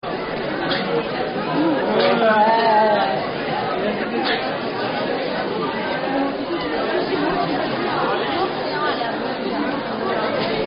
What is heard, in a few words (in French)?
ambiance foule